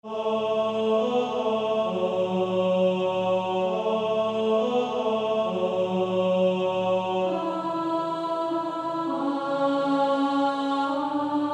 Male Choir

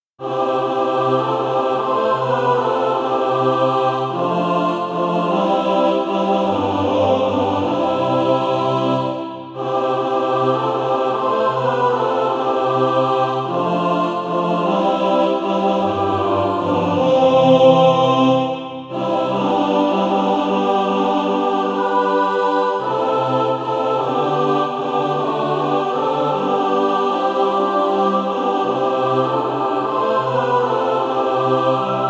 mixed; Far Out on the Desolate Billow

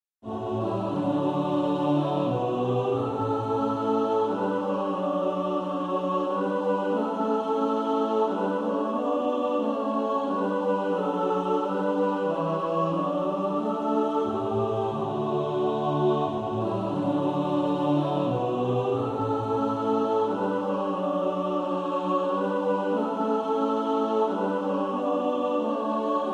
mixed

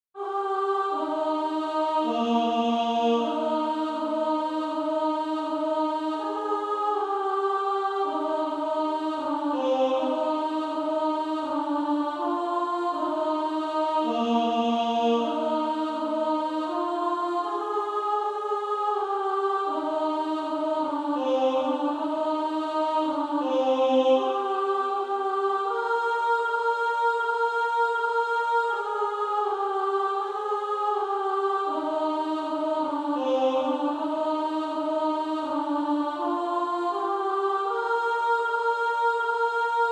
Female Choir; He Leadeth Me

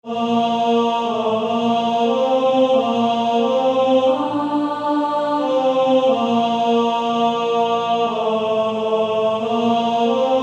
Male Choir; Grace Greater Than All My Sin

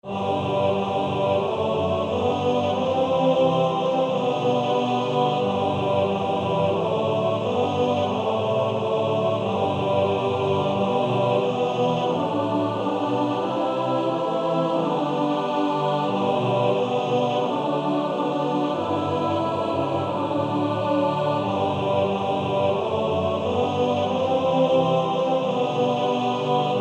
Male Choir TTBB

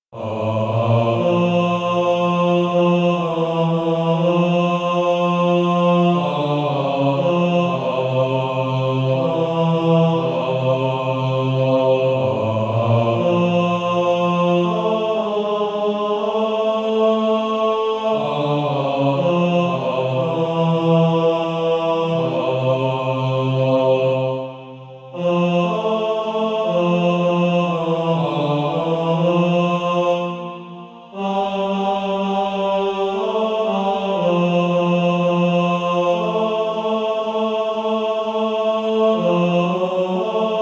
Male Choir

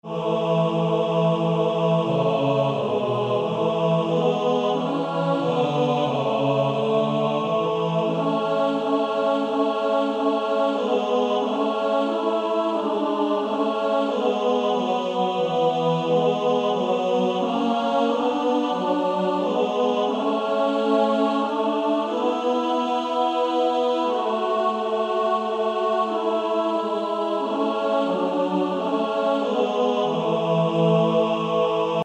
Male Choir, TB

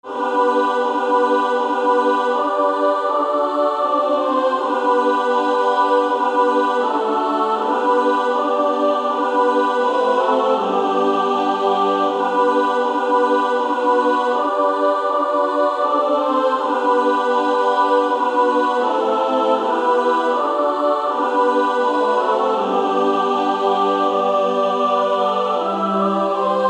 Female Choir SSAA; Angels We have Heard on High

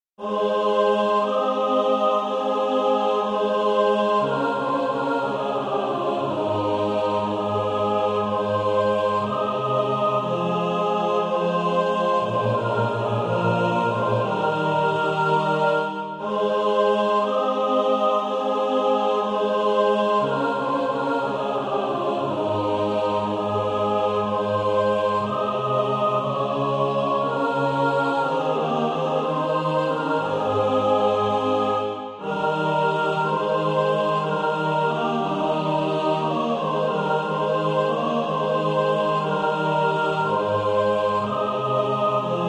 mixed; Praise Him Praise Him

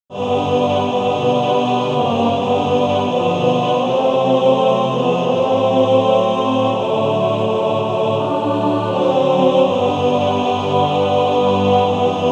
Male Choir TTBB

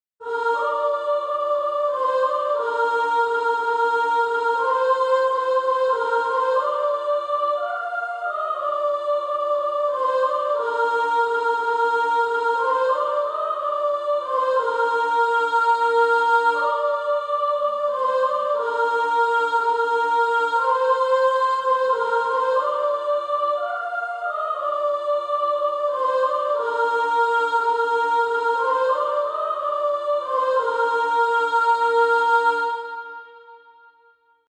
Female Choir, SSA 3-part, Practice Music; 1st Soprano

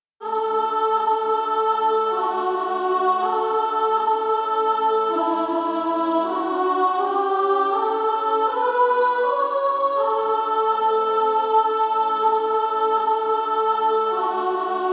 Female Choir S1; 1st Soprano; America The Beautiful

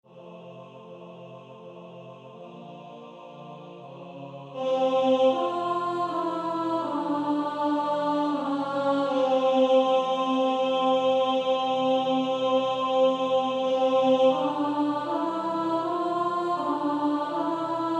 Male Choir; 1st Tenor

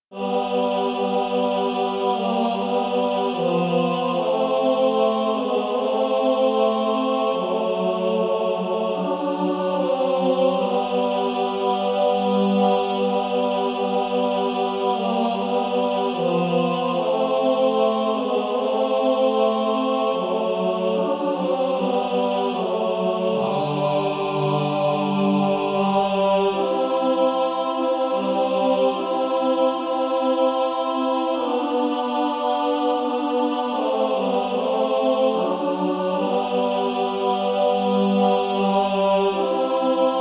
Male Choir

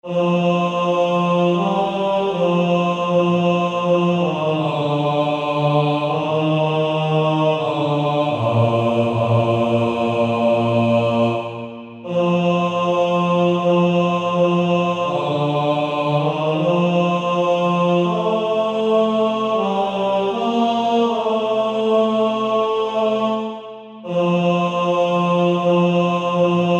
Male Choir

